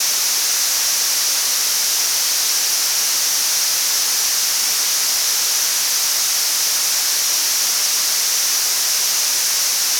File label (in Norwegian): Hoyfrekvent stoy maks ved 5800 Hz; oresuslyder